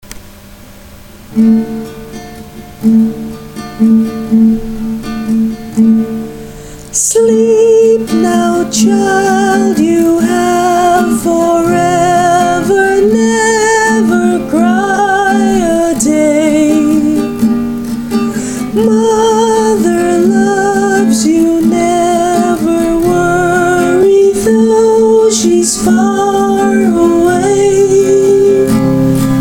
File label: lullaby for a lost child, female older; teodora alonzo